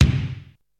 roomy bass drum sound b key, Royality free kick sample tuned to the B note